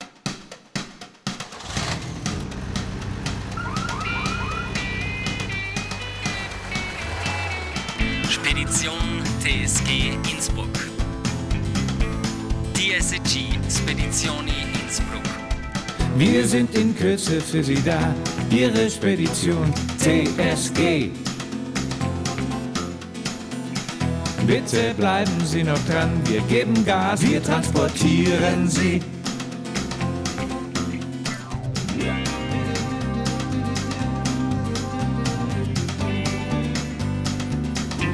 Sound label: audio jingle; tsg